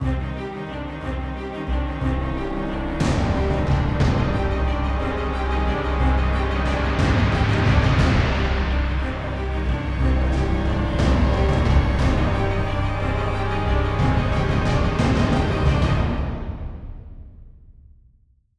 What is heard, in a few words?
suspense drama; Jamie Coleman, Film Composer